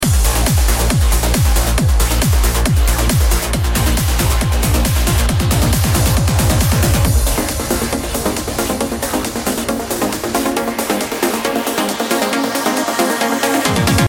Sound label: Uplifting Transition, No-Talking DJ Mix (All Instrumental)